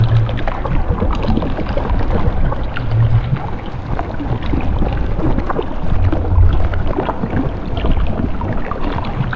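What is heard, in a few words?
blood river loop; ambient soundscapes; dota; diretide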